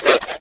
Fire Twirl; Henchman; Playable Tutorial Level; TR2

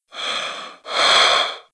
Vehicle Divesuit Breathe; Playable Tutorial Level

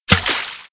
Ratsplash; Playable Tutorial Level

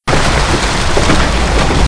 Waterwheel; Playable Tutorial Level

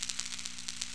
Laser; Mapper

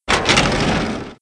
Drawers Metal Close; Playable Tutorial Level

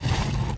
City Portcullis; Playable Tutorial Level; TR3

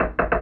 Ratchet; Playable Tutorial Level

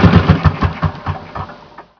Vehicle Snowmobile Stop; Playable Tutorial Level; TR2

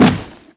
Object Box Hit; Playable Tutorial Level

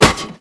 Vehicle Motorbike Front Impact; Playable Tutorial Level

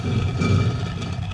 Pushables Move Stone; Playable Tutorial Level

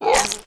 Siren Attack; Playable Tutorial Level

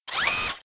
Autogun Unfold; Playable Tutorial Level

